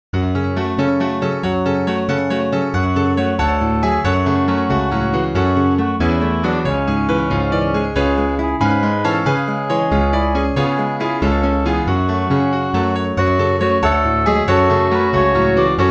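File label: gospel acclamation english midi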